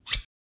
Knife Swoosh

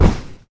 Puff Of Smoke

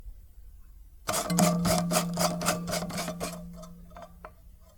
Screwing In Light Bulb

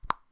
lip gloss pop

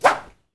Whip

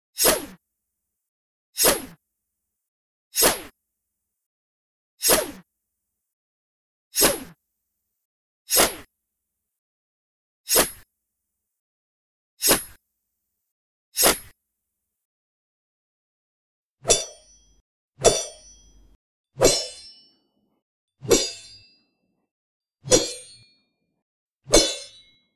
Throwing Projectile Blades And Deflecting Sounds

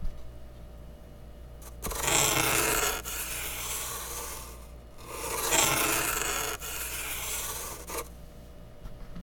Cardboard Box

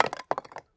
Rolling Rocks

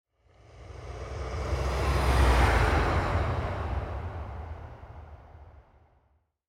Car Swoosh

